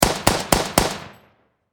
Warfare Machine Gun Vickers Four Shots Close Perspective; Machine Gun Sound Effects; SFX Bible 6